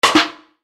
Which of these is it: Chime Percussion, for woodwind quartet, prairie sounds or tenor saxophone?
Chime Percussion